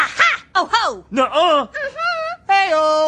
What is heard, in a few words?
aha oho nuh uh mmm hmm heyo